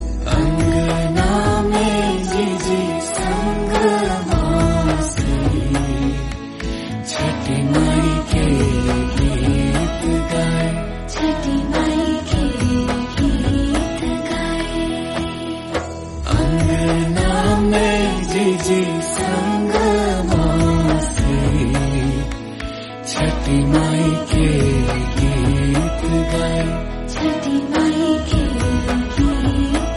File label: Chhath Puja, Bhojpuri